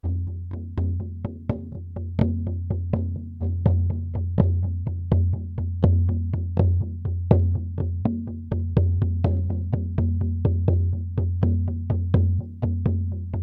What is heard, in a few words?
Shaman Hand Frame Drumming